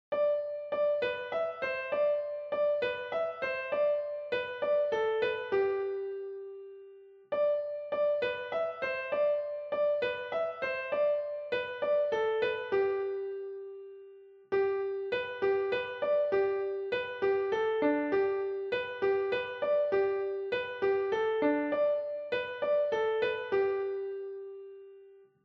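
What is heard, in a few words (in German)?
Kommet ihr Hirten, Klavier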